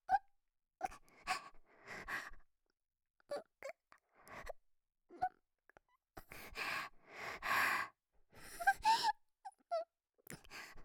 ん…ん…, 左耳, ASMR

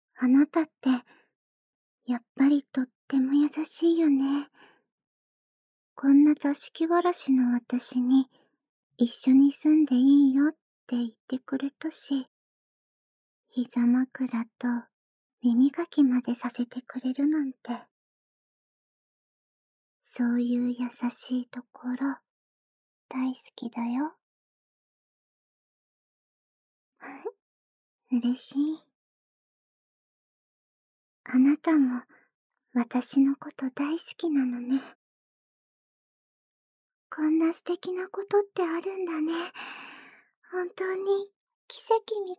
耳舐め・耳愛撫; ツキマトイ～座敷童と同棲おセックス～

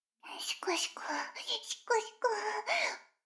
シコシコ音声; 逆時間停止されて

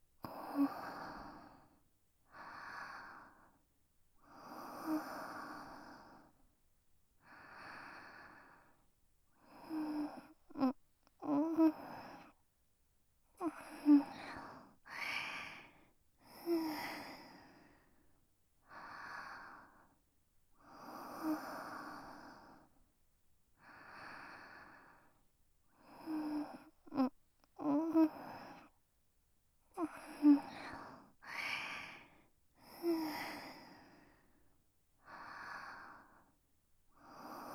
寝息音（左）, ASMR

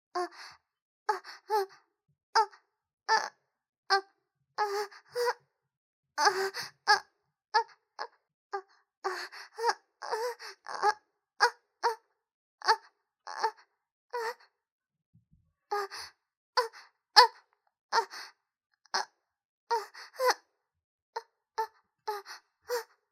ノーマルマイク あ、あ、あ、あ、

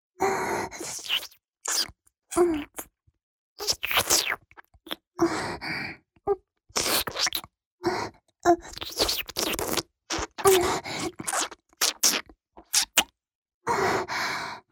フェラチオ(弱):亀頭を遠慮がちに舐める

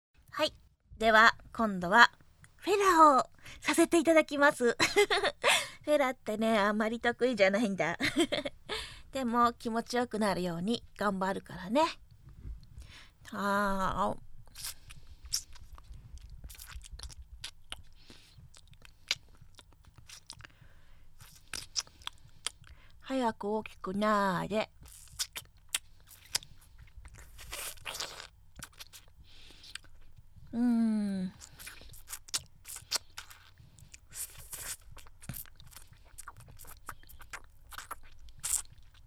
擬似フェラ実演; オナニー実演音声

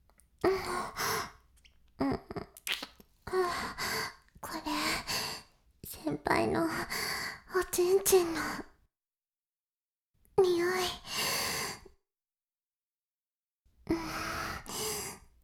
フェラ音声, ASMR; 白くて黒い魔性円環

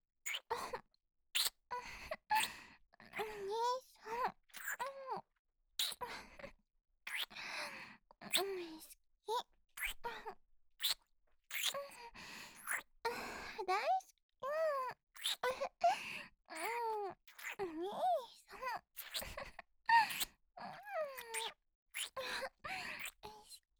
普通キス 激しめ 好き好き

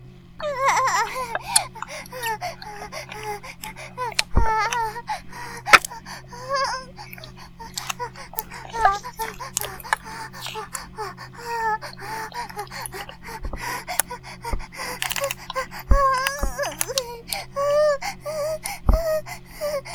バイブ放置; イクたびにわけて

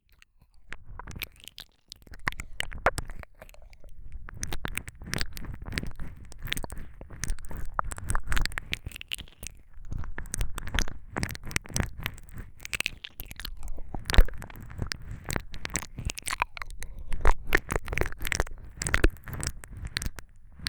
リアルな耳舐め

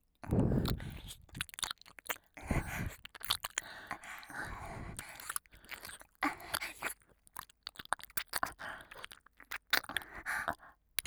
男の娘ダブル耳なめ,ASMR; 逆転!?男の娘スイッチ～お兄さんのことが好きになっちゃったかも～

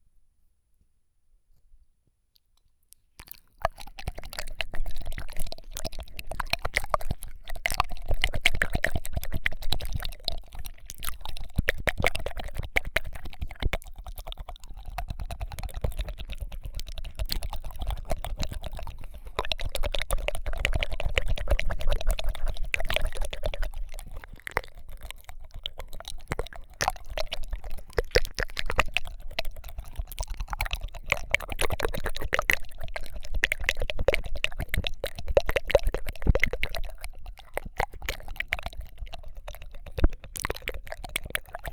両耳同時に舌を早く動かす耳舐め; 癒やしの耳舐めワールド4

